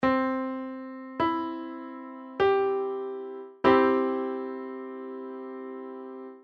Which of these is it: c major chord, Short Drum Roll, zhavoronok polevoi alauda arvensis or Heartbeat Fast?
c major chord